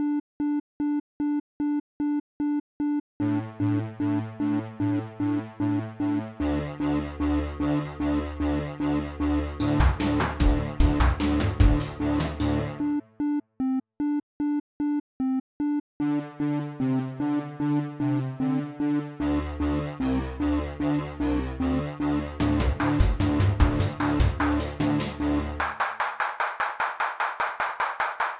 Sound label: beepbox song